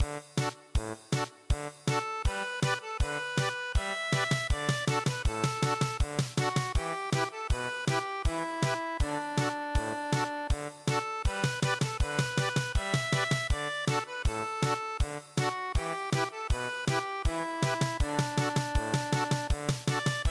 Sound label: German Polka, midi; Schlaf ein mein Blond-Engelein